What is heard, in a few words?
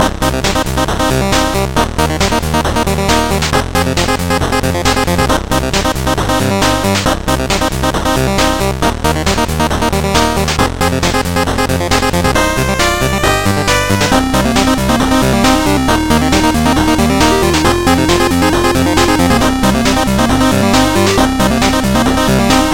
8bit